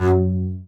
F#2 spiccato, arco bass; Northstar - New Gold VOL-2

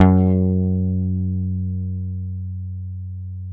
F#1 JAZZ GTR; Univers Sons; Jazzistic

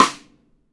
METAL, RIM SHOT

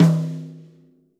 rack tom - snares on; JazzFunkKit

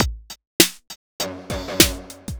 dark beat; Sonic Foundry (Sony Creative Software); Ugly Remnants VOL-2

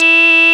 METAL2 E3; CMP - Analogon

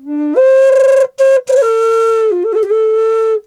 D wild flute

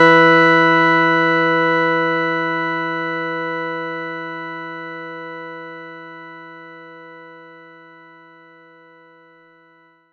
Chord; ModelCycles

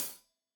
Hat Tight, Hi-Hat, Cymbals; Deeply Sampled Drums

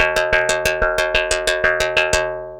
FX SWEEP, SYNTHSWEEPS

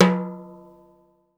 timbale hi, Yamaha Motif ES drum machine; 300 Drum Machines; Yamaha Motif ES-Series

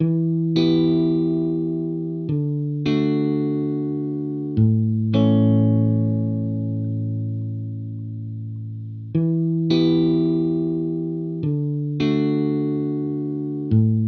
Main Guitar, 105BPM Emin; 99Sounds; Monosounds; Music Loops; PANDORA BOX!; Motel Love

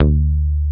Basses, Roland

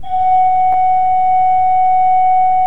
F4, Cathedral Organ; ILIO; Propeller Islands

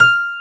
PIANO C5; AKAI S6000 CD-ROM - Volume 1; MIX PIANO

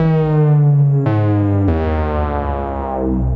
Swoop Bass, Drum N Bass

